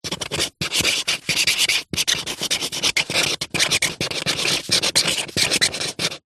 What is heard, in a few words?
office pen highlighter write on paper on cardboard